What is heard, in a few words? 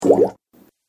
Goop Sound, cartoon